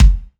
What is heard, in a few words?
F Stand Kick, Fusion Kit; Akai MPC500